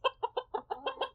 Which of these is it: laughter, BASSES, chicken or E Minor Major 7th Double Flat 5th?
chicken